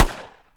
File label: smith wesson shot